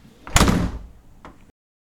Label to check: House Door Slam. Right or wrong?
Right